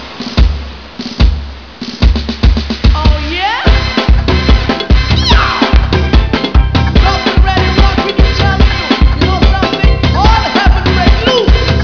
drum boy

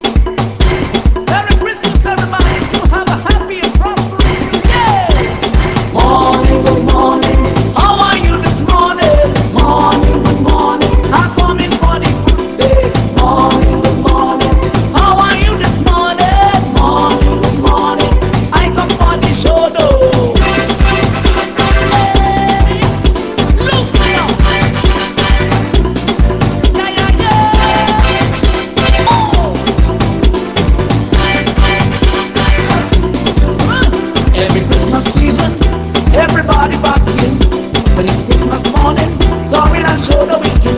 xmas med

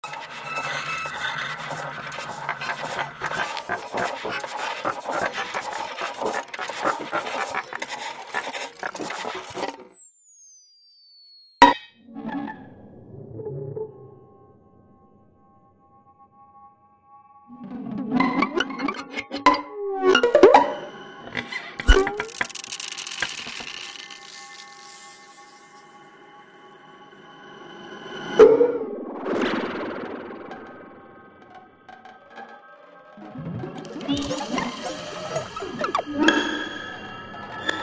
Violin

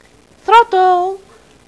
throttle

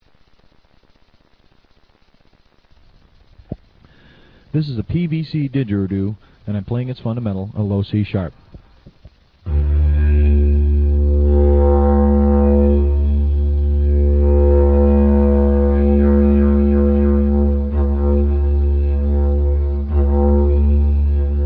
didjeridu; vienna

